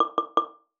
login; voice-cat

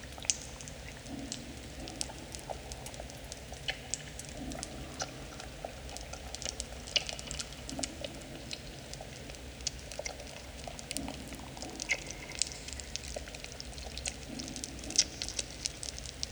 cave; assassin-bug

